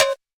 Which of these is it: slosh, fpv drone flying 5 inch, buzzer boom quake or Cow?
Cow